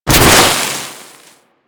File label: Heat Gun Hit Effect; Grineer